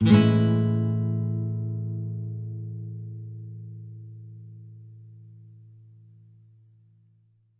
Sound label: Nylon Chord A7, nylon guitar chords